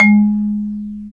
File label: Bass Marimba